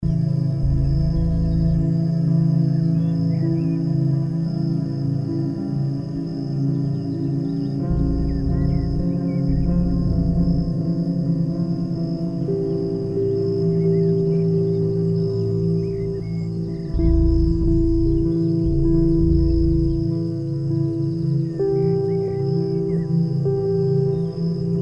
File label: Healing Atmosphere; AudioSoul